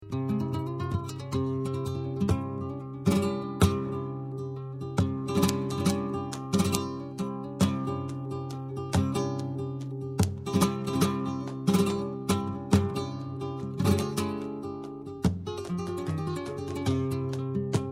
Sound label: solbul, flamenco